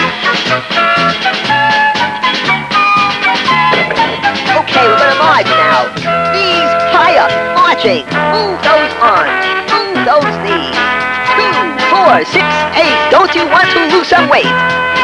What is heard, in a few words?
march